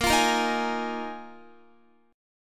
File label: Bb 7th Suspended 4th Bb Guitar 4E,4A,5D,5G,5C,6F; strummed